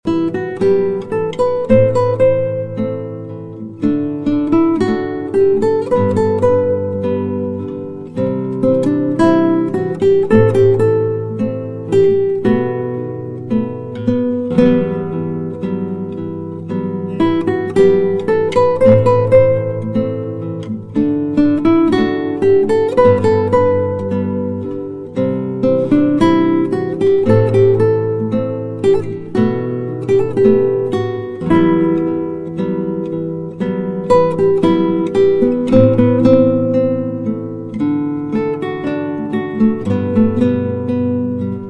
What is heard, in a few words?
guitar